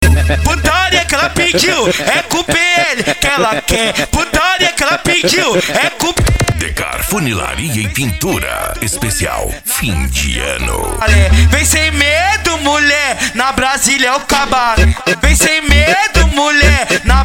funk ritmado

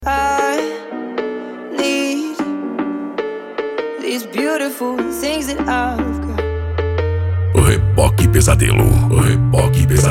sound car

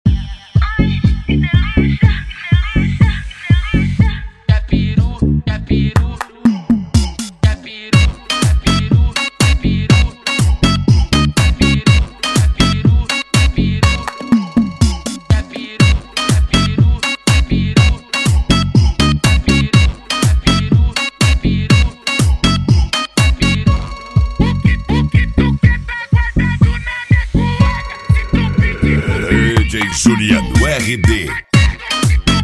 mega funk automotivo

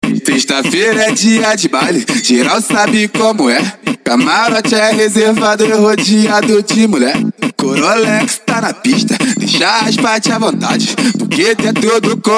funk mega bass

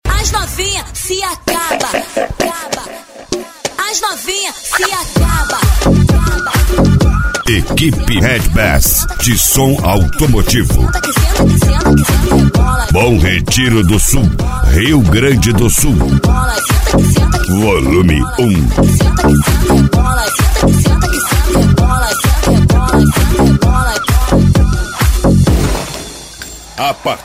som automotivo; equipe redbass

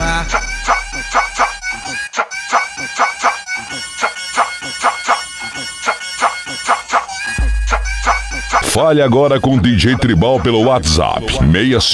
funk bass antigo; cgb cargo transporte e logistica

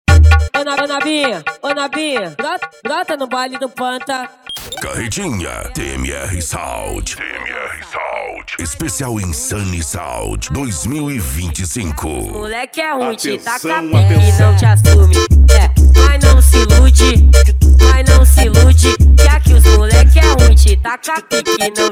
esp insane sound

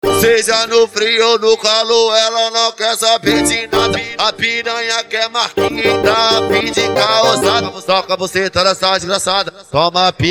bombox funk

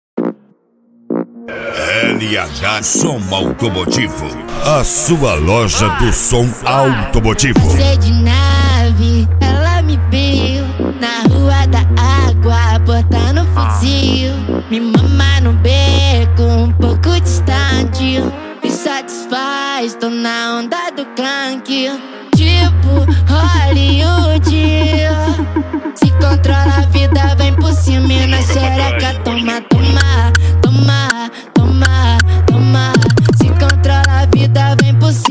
som automotivo

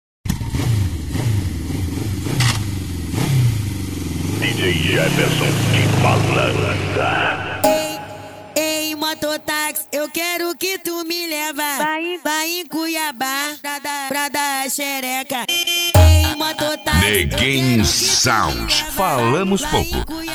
summer eletro hits mais funk

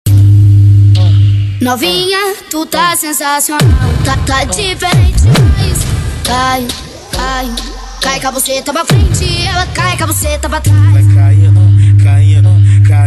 funk altomotivo; ap sport car som e insufilme

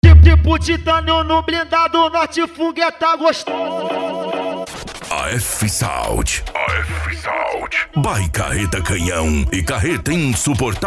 esp funk automotivo; afsound

